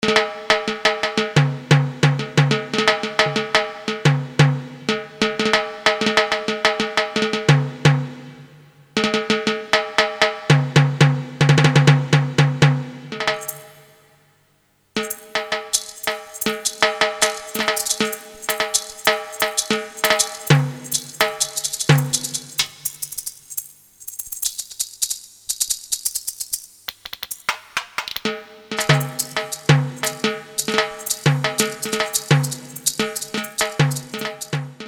TIM&SE, Latin Percussion, Korg DSS-1

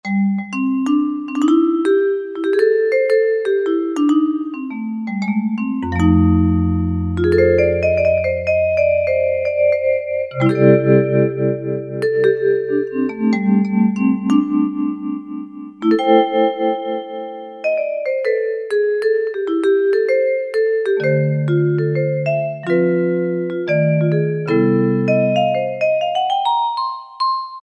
Cool Vibes, Alesis QS8; Factory Preset examples

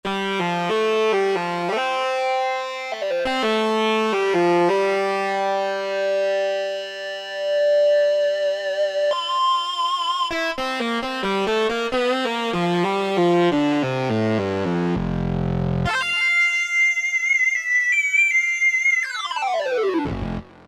SL, Yamaha TG77; Factory Presets; 14 SL Nester

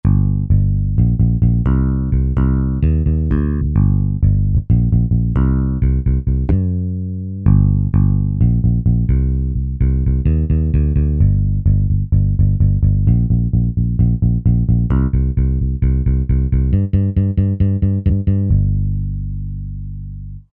Rock P.Bass; Roland SR-JV80-09 Session

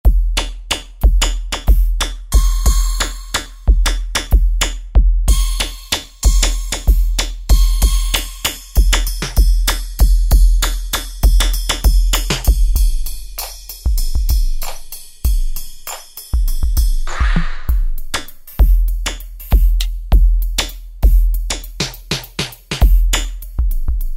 Asylum Kit, Alesis QS8; Factory Preset examples

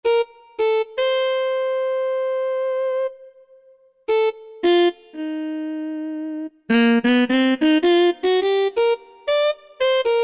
Mute Trp, Korg M1; Factory preset examples

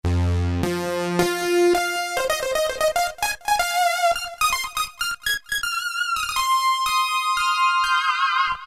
Energetical Lead; Roland PN-D50-03

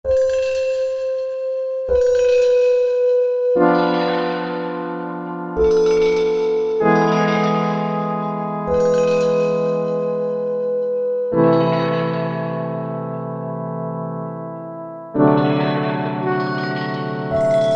Fun Flute, Korg MSC-14S Sound Effects

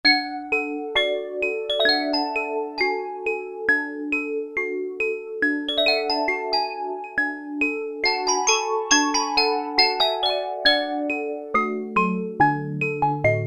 Handbell Choir, Roland MKS-70

